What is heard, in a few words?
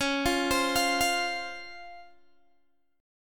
Db Major 7th, Guitar, Open G